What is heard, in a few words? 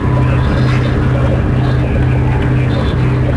flight; Star Wars Jedi Knight II Jedi Outcast; XBOX; ambience; doomgiver